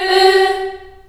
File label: G3 CHS EH, CHILD EHS, Choir; USB Soundscan vol.28; Choir Acoustic & Synth; AKAI